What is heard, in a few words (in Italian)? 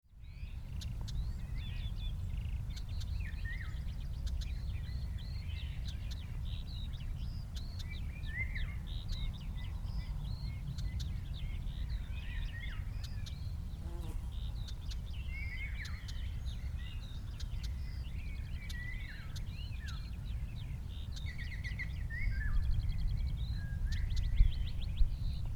Averla piccola